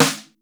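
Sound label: processed snare, drums; Crypton - DrumBase5000; PROC.DRUMS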